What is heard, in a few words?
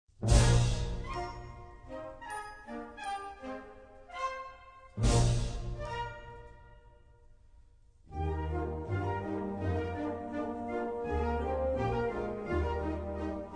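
Polka, Band; Annen Polka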